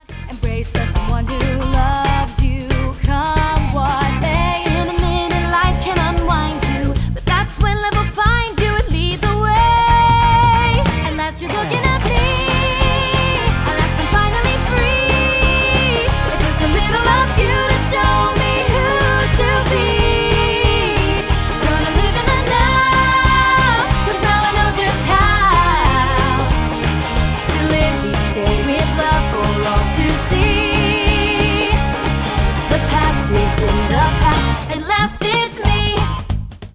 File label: Vocals